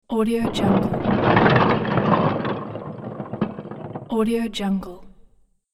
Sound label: building wall collapse debris